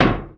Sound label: IMPACT Metal Soft Plate; mono; IMPACTS; Universal Sound FX